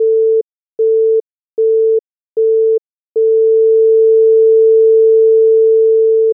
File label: Stereo Test